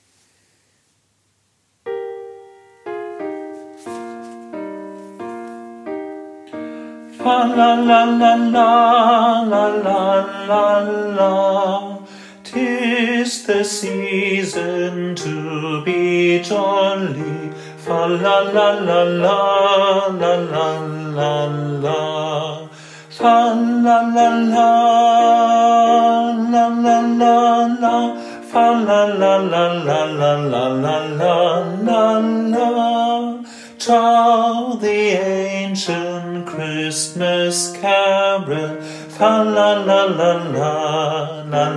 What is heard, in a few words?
Bass; Popchor